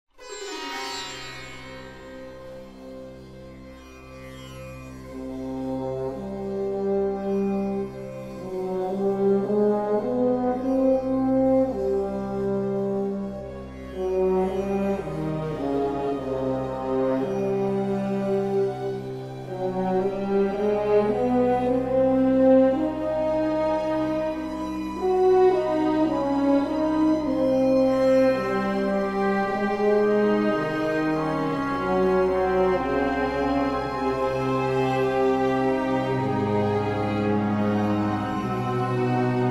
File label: Music; Age of Empires III; cinematics